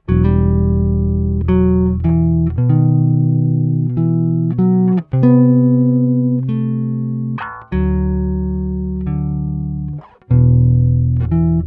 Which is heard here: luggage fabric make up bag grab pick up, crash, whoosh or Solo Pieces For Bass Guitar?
Solo Pieces For Bass Guitar